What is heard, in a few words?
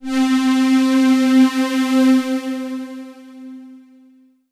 Yamaha FS1r Ensemble C4